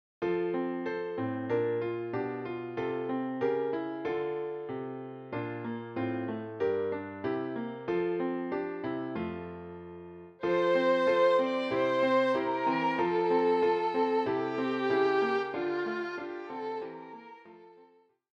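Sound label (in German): Violine; Alle Jahre wieder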